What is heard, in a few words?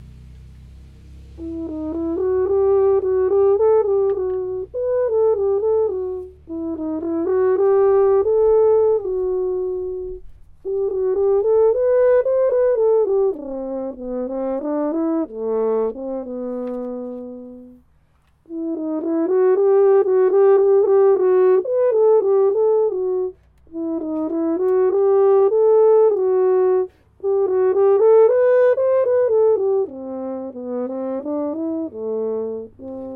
horn solo